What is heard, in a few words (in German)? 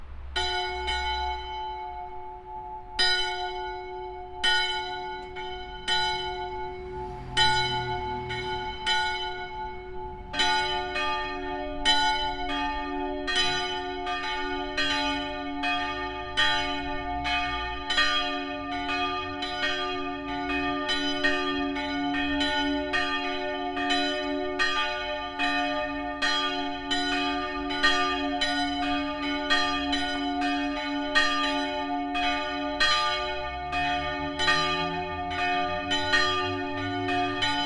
Glocken St Anna; Kirche Schwendi